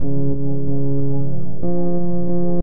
loop

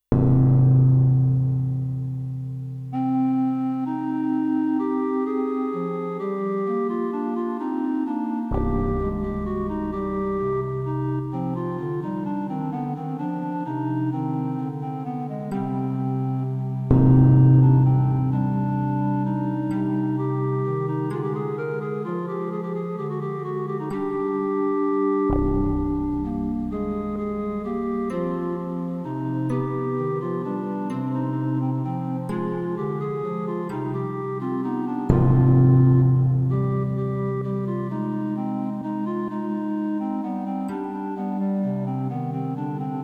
Dungeon; darklands

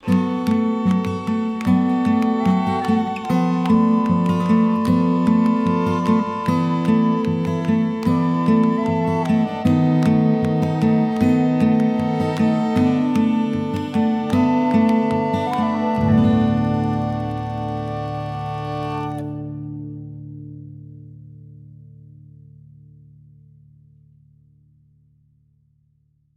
Stinger Theatre Intro; Standing Stones

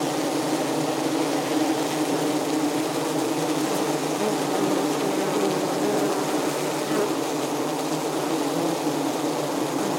Sound of Beehive; WorldBox

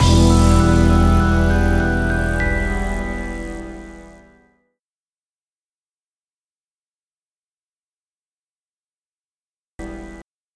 JINGLE DIE; the spy who shot me; ps4 switch windows xbox one xbox series xs

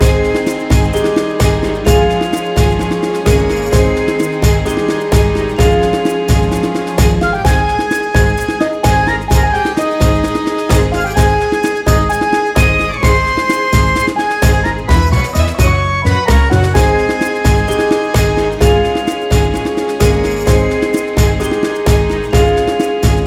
Cheerful; Fae Tactics; Xbox Series X/S

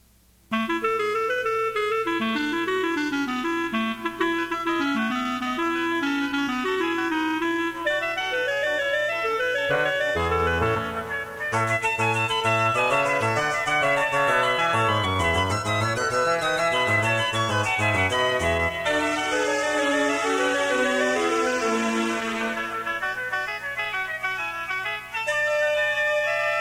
Baroque in Brick; lego island remastered cassetes

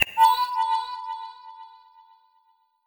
Card Xyz Out

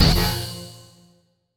Effect Invalid

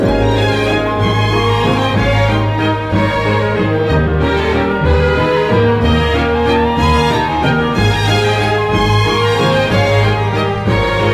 Bright Theme Loop

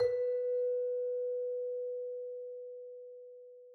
Scale; Second Scale2; tintin reporter cigars of the pharaoh

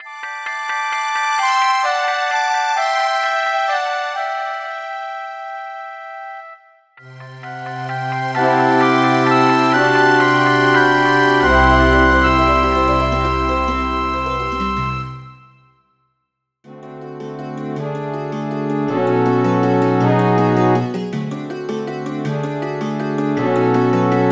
High Score; blast thru

